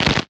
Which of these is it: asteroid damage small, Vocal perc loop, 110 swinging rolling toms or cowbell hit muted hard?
asteroid damage small